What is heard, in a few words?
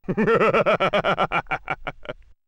laugh